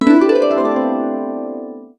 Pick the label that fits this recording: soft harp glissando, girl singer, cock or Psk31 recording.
soft harp glissando